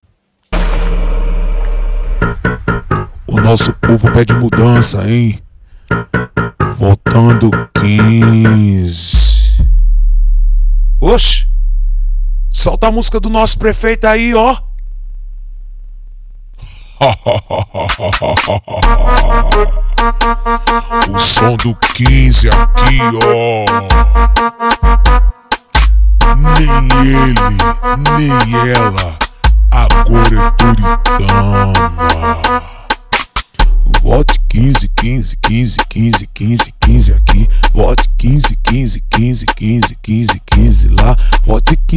funk

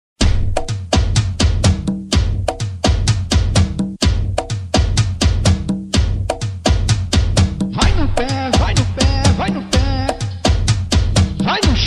funk novo

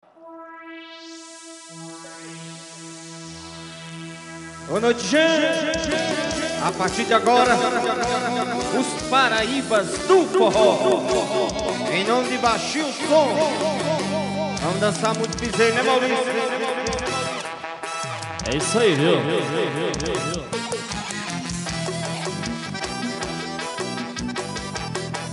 passagem de som

